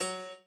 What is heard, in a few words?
piano